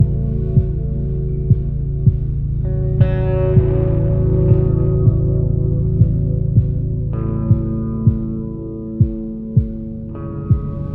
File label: Cave (wither sick); minecraft story mode gamerip 2016; A Block and a Hard Place